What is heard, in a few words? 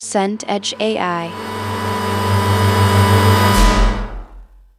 transition swell orchestral